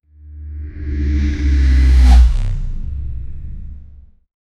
sci-fi video game power up

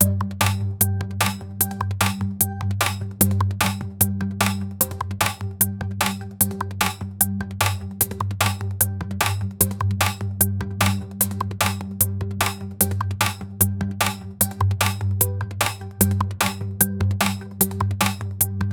looped percussion, 150 bpm

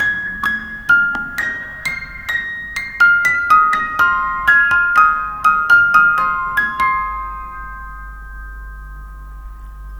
haunting waltz on music b, music box

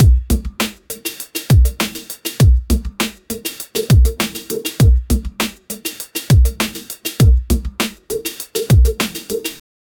fast paced adventurous be, beat

